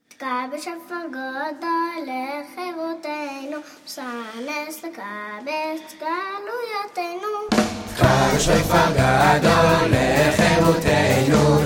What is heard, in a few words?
Sound the Great Shofar teka